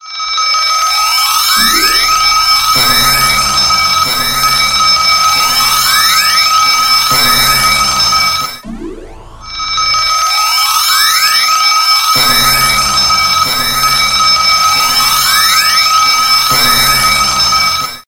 Iphone 3 Clock Alert

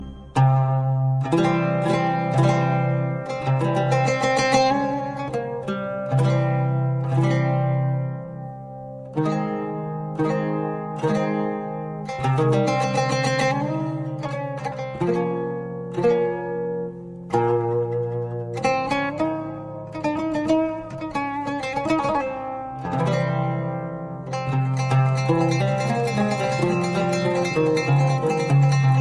Orient Oud

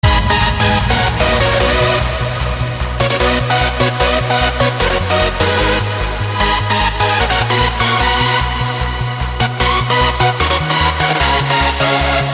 Hardstyle

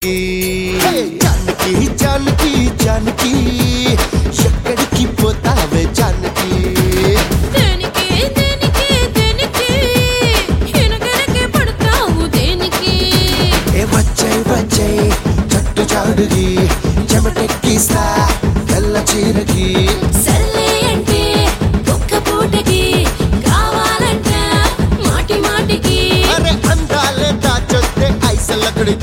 Telugu

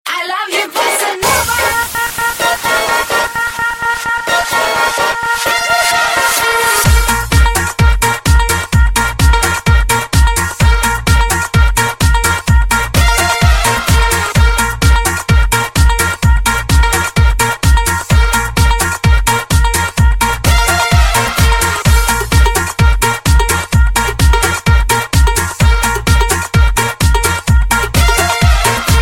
Bossa Nova

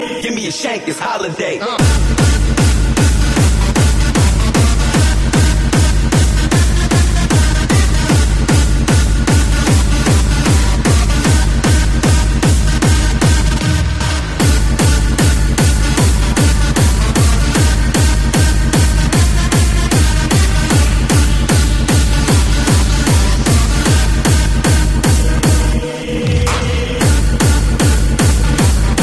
Bass

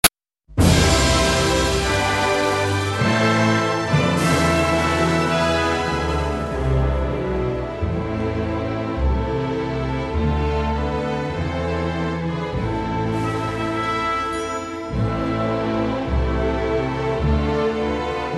Bulgaria Himn

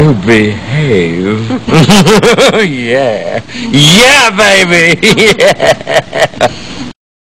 Sms Tone

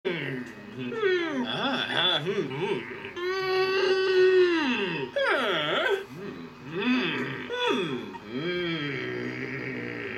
"Hmm"

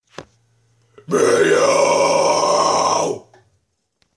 Death Metal - Meow

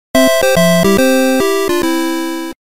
8-Bit Game Over

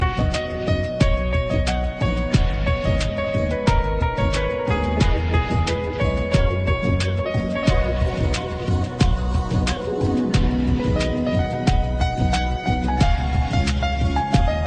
Piano

